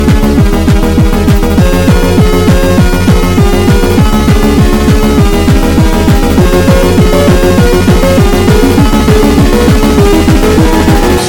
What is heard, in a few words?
bgm